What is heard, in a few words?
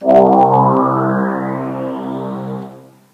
Windows NT 6.0 Workstation Startup; Windows NT 6.0 Workstation with MIE Startup; Windows Never Released Soundpack 1